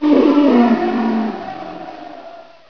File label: die, friendly